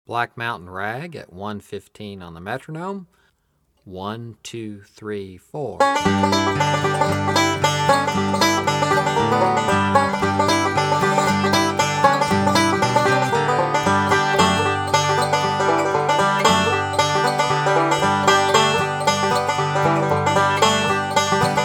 Black Mountain Rag Breaks, 115 Bpm

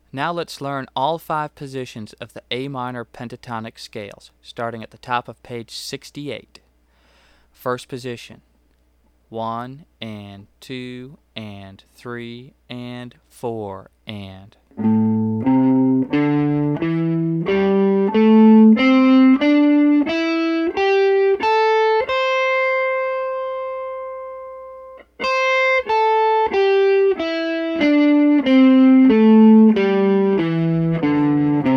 Am Pentatonic 1st Position

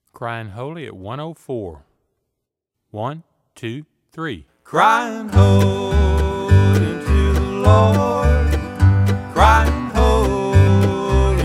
Crying Holy 104 Bpm

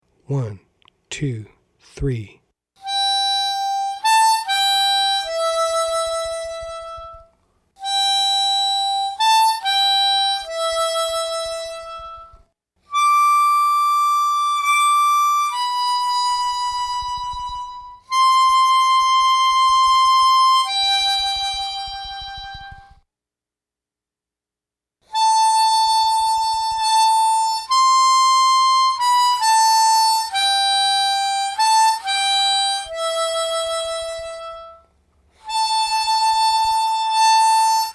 Silent Night Slow

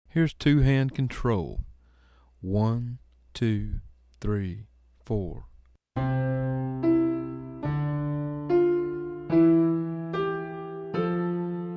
Two Hand Control Slow

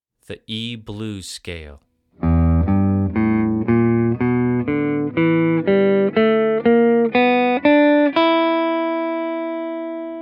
Blues Scale

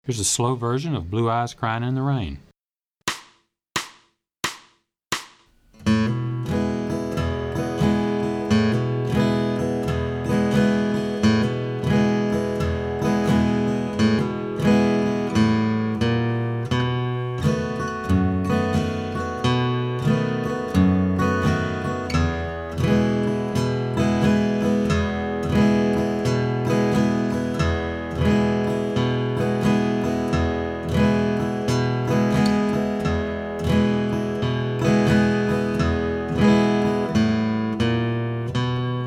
Slow

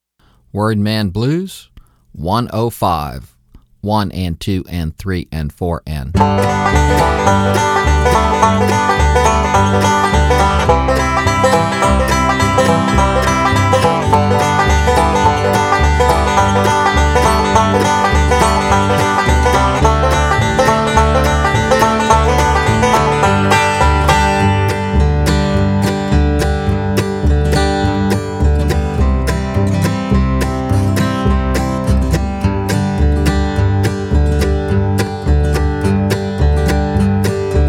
105bpm; Shady Grove

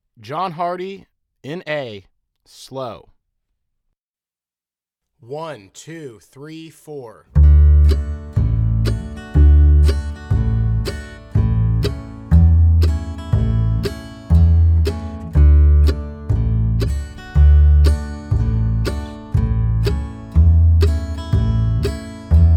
John Hardy A Slow

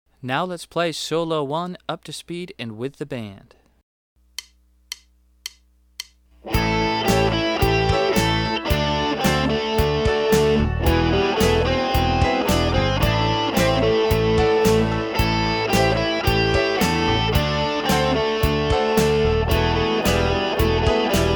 Solo Fast

